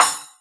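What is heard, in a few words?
Boss DR-202 cymbal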